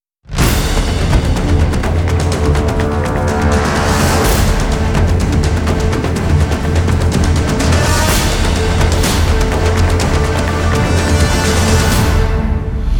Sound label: Percussion